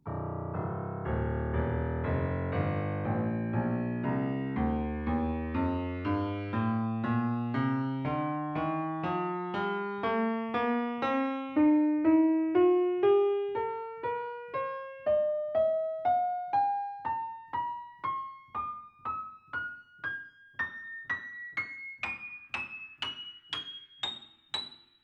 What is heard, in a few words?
scale orig